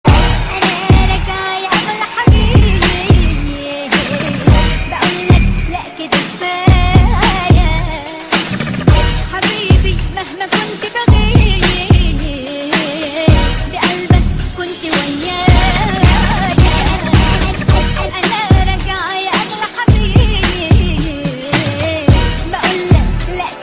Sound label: arabic tone ringtone